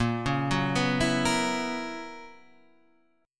B Major 7th Suspended 2nd Suspended 4th Bb, chord shape